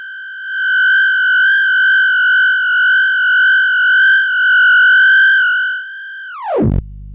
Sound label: volume test, Theremin; Building a Theremin